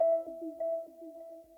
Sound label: Contact Online Sound; eM Client